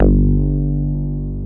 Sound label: PENCIL BAS. G1, SYNTHBASS